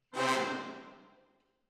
Hall, Jazz for piano

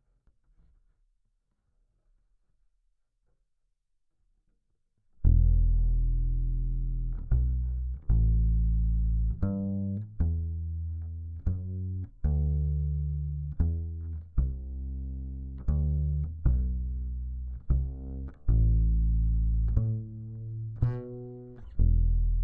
Bass DI

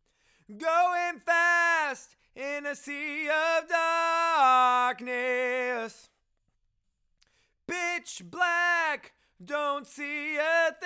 Chorus

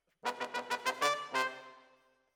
Brass

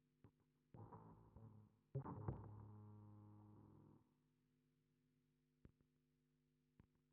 Bass Sansamp

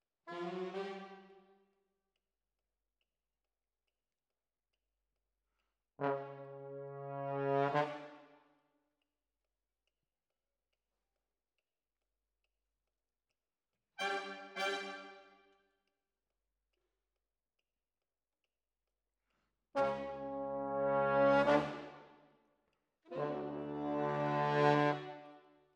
Trombones, jazz for piano

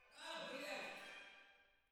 Timp

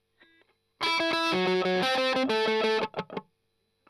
Strum Octav

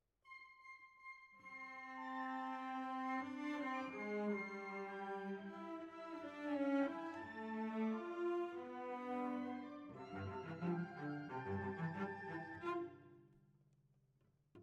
Cellos

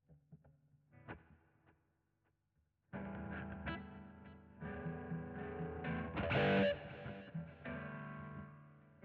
GTR

